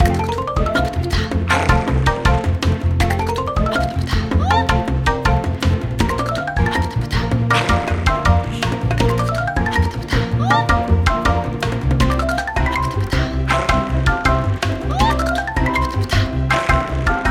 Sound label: Jungle Joint (Speed Up); Cooking Dash